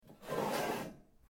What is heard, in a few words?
metal rods move; Sound Effects:Materials; Foundation3